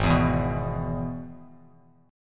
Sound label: Gsharp Minor Sharp 5th, Bass Standard; strummed; 4,7,2,4